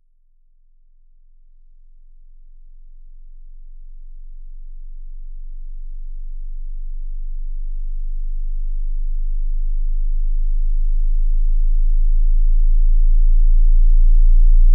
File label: sweep with DC